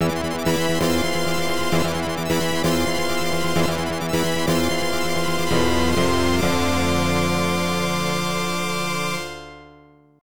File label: Mission Failed; Operation Wolf; arcade